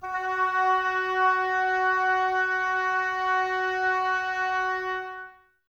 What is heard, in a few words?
OBOES F#, Woodwind Brass Ensemble; Wood Winds; Miroslav Vitous